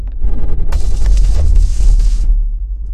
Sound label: glitch error